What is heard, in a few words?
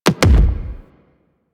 Weapon Punch Double